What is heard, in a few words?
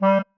marimba penguin; ゆめ2っき